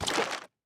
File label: strider step; Minecraft